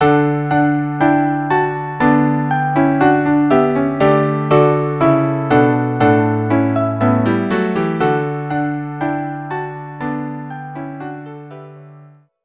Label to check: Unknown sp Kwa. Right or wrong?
Wrong